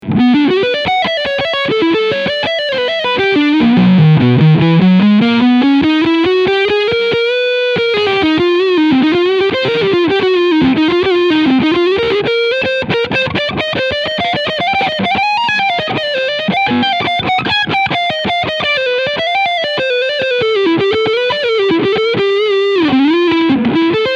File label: PRS S2 McCarty 594 Overdrive Front Pickup